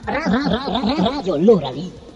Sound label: jingle; LORAL